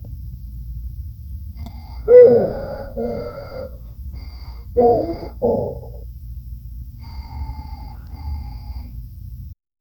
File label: big dogs retriever soft g; pet